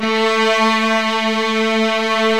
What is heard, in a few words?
HIGH STRINGS